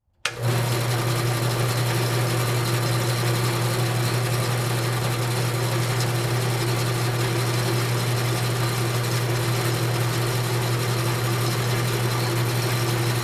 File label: Industry Table Saw Turn On And Off